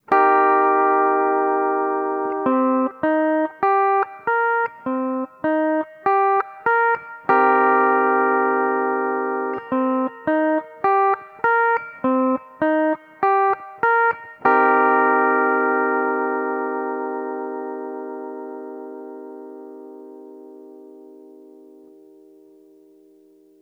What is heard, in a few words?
cmin7, steel guitar